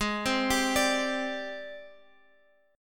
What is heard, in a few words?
Ab Major Sharp 11th Ab down Guitar Open F; AbM#11 arpeggiated; JGuitar